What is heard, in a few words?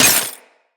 Melee Impact Glass, Weapons